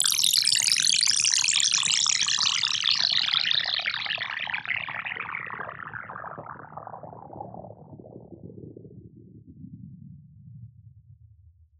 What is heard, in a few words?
Downshifter Liquid; Riddim; One-Shots; SFX; Ghosthack; Riddim Essentials Volume 2